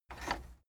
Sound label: cassette eject push